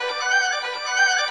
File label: glassian orchestra; 184; melodic loops; strings orchestral classical loops; philip glassian